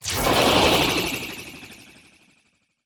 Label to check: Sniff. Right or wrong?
Right